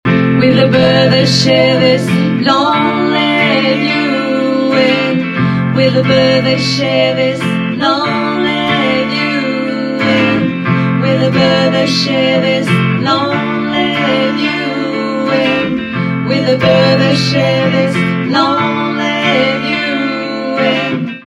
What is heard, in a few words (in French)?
Travail Chant